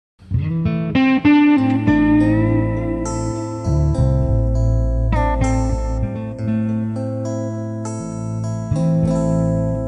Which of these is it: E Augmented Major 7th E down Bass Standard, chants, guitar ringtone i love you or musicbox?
guitar ringtone i love you